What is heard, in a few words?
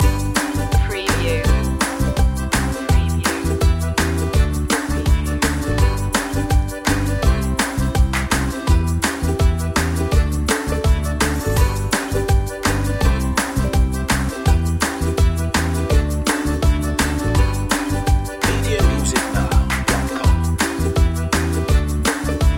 learning for life loop